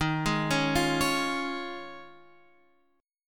Eb 7th Suspended 2nd Suspended 4th Eb, Guitar Standard; arpeggiated; chord